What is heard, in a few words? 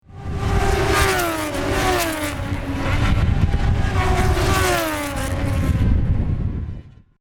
Racing SE